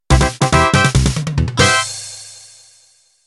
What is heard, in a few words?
Quebradita Intro